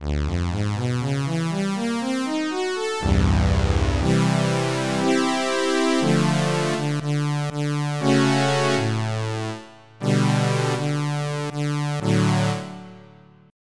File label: Bright String; Onj's Soundfont